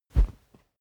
whoosh cloth soft dry; swooshes-swishes

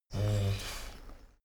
dogo argentino whine snort; natur-tiere; hunde

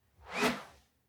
whoosh whistle soft; swooshes-swishes

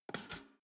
toaster pull up; maschinen

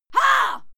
female fight scream hah; schreie; menschen

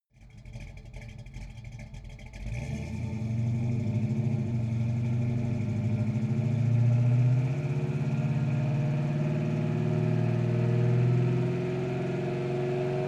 car camaro accelerate heavy exhaust

